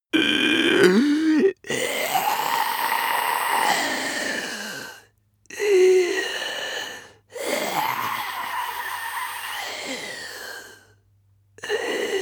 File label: zombie male breath throat